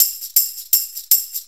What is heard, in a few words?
Shuffle Perc, Perc Loops